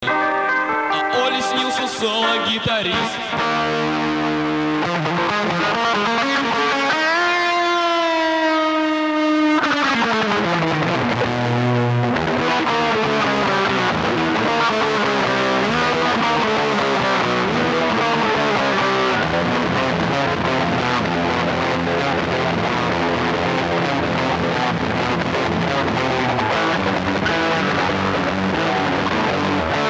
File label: solo guitar